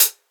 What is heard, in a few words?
Closed Hihat, House; Drums; Cymbal Stuff